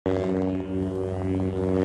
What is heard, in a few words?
Lightsaber Idle